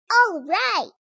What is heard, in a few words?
exclaim; Chibi Knight